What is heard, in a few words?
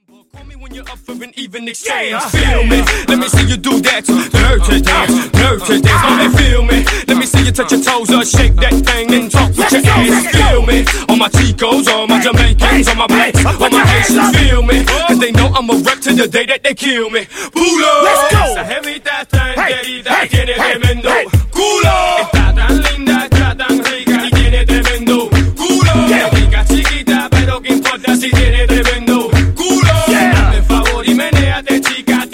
Transition Remix 120 126Bpm